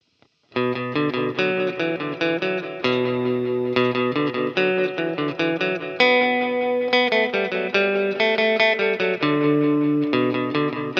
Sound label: Echo